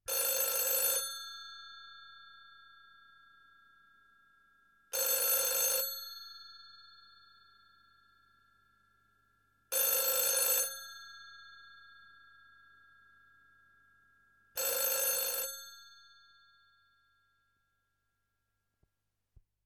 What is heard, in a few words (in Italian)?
SQUILLO TELEFONO; phone